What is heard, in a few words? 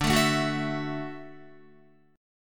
D-Major Add 9th D down Guitar; strummed; JGuitar